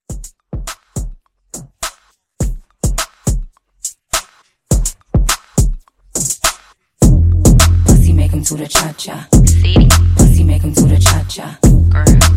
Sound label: Mixshow Re Drum 104 Dirty